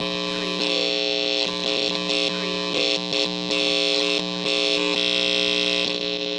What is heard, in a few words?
Electric Unstable Short